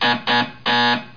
BUZZER; TOYOTA

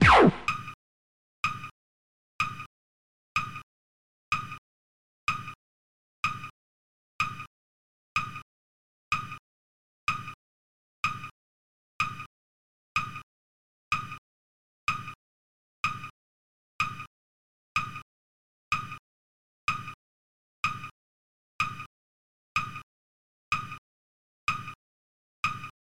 COUNTDOWN SFX; Now Pop Quiz

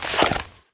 thermal throw; MOTS

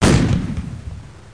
Charge Explode; Triple-A 1.6

